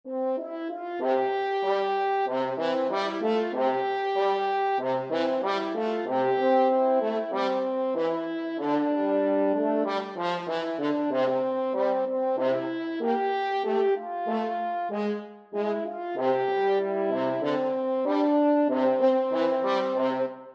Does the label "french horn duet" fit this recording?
Yes